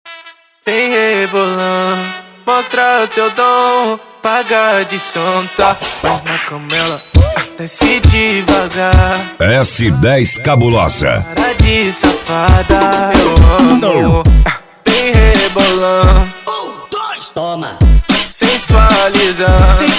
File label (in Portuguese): funk comercial